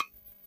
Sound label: tap map